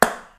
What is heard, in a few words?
pumpkin break